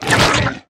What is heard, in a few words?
excavator beartrap close; monster within; soundmorph